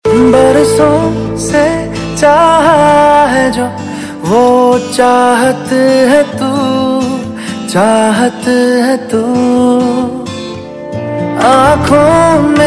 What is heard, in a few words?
New Bollywood Ringtone